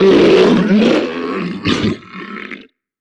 troll monster death; Vocalizations; Gamemaster Audio

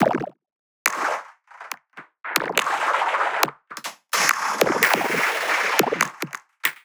Loop, 70 bpm, Circuitbend; 99Sounds; Mangling Audio; Loops

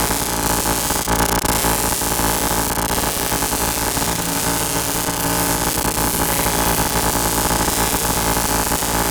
Magic Bonus Electricity Loop; David Dumais Audio; Magic Sound FX Pack 1

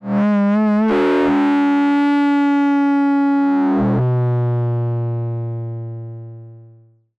Dying Moog; Tyrann0s; Distorted Reality

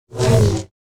Saber; FXHome

